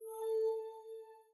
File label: w2k - notify (A -1oct)